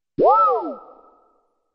Mail Transmission

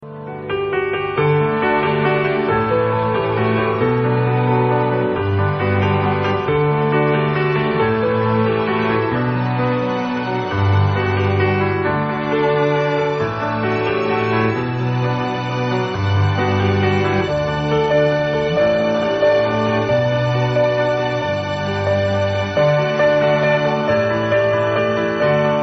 Airtel Endless Tone

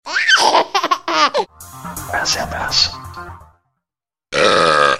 Burp Laugh

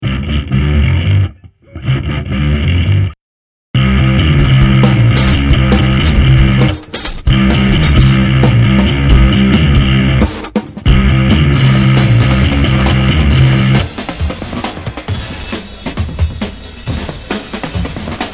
Drum N Bass

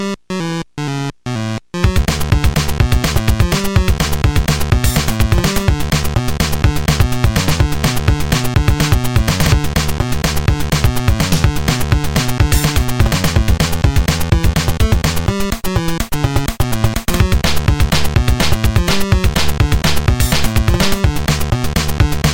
8bit Goof Rock

